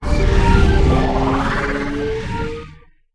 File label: Warp Out